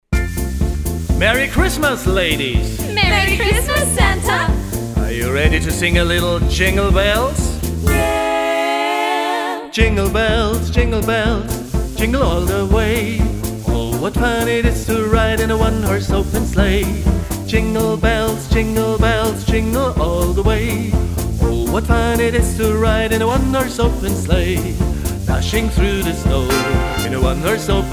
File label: Jingle Bells; MTA Version